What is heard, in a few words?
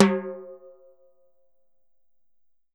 TIMBALE, Salsa Kit; E-MU Producer Series Vol. 7 – Old World Instruments